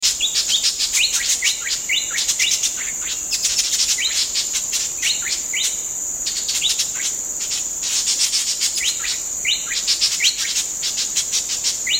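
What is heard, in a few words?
crimson-winged woodpecker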